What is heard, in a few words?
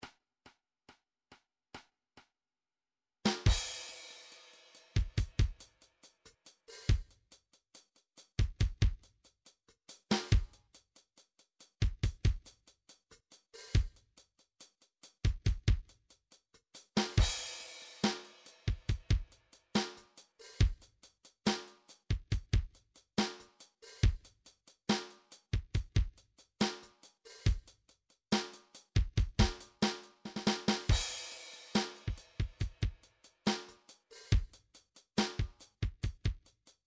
R&B 140 half time; Sonar